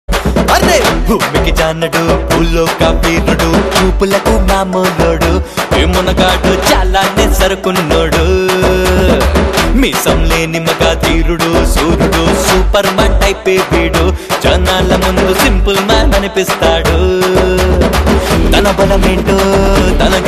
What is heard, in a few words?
BGM; Paparayudu